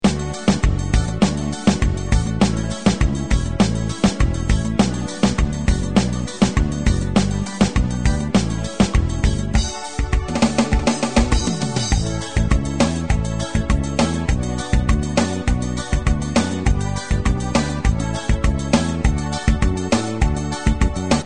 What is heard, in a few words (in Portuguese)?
forro